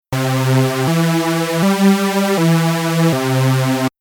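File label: synth mono legato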